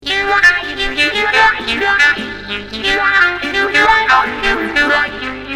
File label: sax section